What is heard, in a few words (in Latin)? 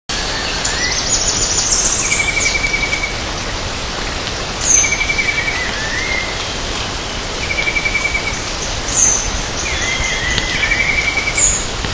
Hylopezus nattereri; PP Esmeralda; Jorge La Grotteria